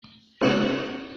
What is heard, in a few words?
single drum